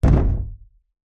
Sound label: impact wood thump hit hollow